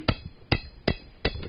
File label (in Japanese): ボール, 生活音; 生活音系効果音